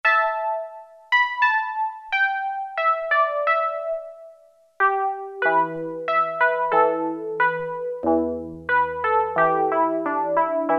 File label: Koto, Roland Juno-106; Factory Preset